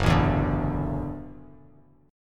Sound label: Gb Minor 7th Sharp 5th, Bass Standard; strummed; JGuitar